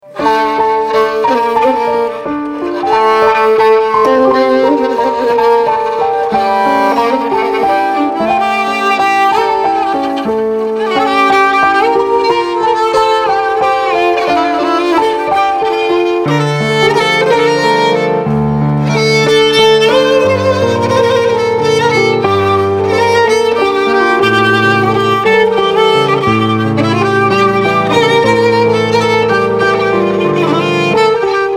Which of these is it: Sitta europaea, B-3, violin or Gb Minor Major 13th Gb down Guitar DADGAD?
violin